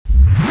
slow motion off

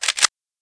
f2k bolt pull, weapons

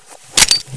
mp5 to iron sights

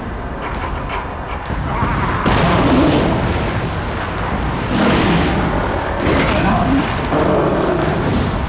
monster grunts

